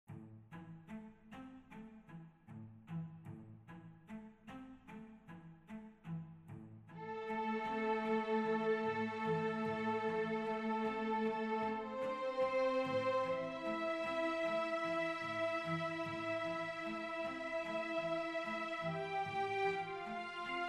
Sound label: background office music; seo company